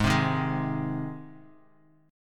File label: G sharp Major G sharp, chord; G# strummed; JGuitar